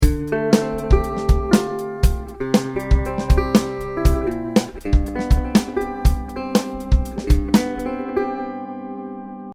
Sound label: timings incorrect; Skill 3